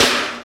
SNR VITAL SN, Snares; AMG; Now CD-ROM (Roland); NOW! Drums

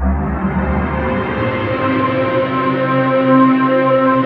ORCH C3

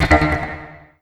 Fill, FX; Sonic Foundry (Sony Creative Software); Esoterik Beatz

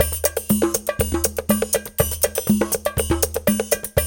120, Coil Conga; Spectrasonics - Supreme Beats - World Dance

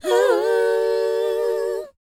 WHOA; ILIO; Vocal Planet

